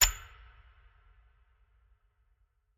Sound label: win sound